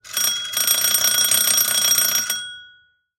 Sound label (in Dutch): deurbel